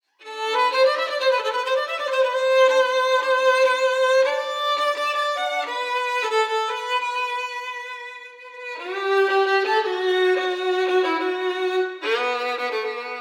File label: Violin; Pourya; Precedence Breeze 2; 5 Positions